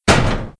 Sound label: Locker Close; Playable Tutorial Level